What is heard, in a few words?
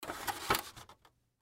Styrofoam Pick Up, Styrofoam Pick Up Fast Tray; Foley; Blastwave